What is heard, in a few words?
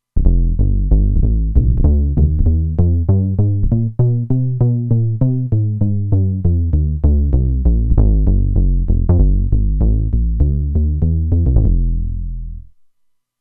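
Upright Bass, Roland Alpha Juno-2; Factory 2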